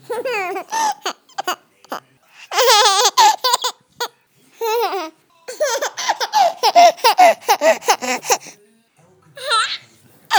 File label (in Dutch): lacht